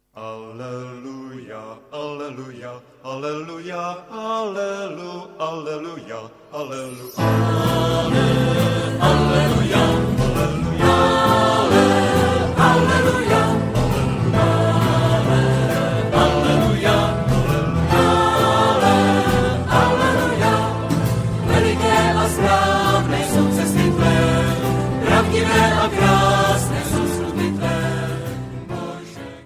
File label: Aleluja